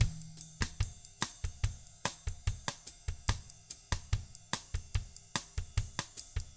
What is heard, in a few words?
BOSSA, Drums; ILIO; Double Platinum Drums 1